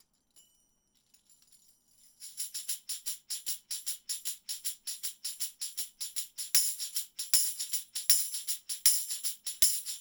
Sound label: Tambourine